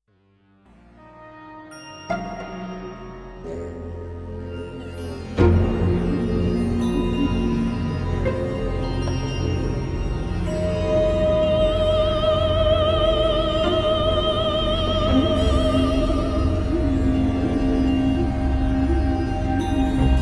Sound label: Female Choir